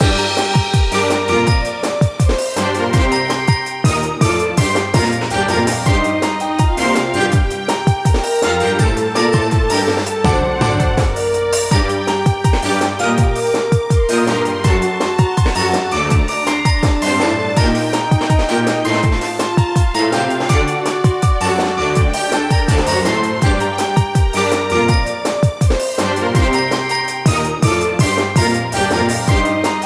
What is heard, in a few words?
Orchestral Boucle; Imagine Modern Dancer; Nintendo DS